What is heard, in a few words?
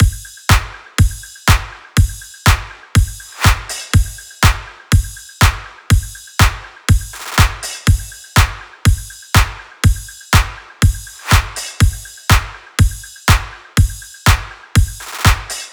drums 122; Drum Loops; Full Drums; Merkur; Slate; MIDNIGHT Sample Pack